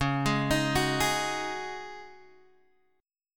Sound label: Db Suspended 2nd Suspended 4th Db Guitar Open G